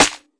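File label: classic hip hop Snare; Classic Hip Hop Mega Kit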